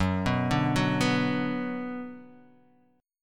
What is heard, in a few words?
Gb Suspended 4th Sharp 5th Gb, down, Guitar, Half Step Down